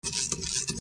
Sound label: screw; AssaultCube; Alien Stars; American Muscle Series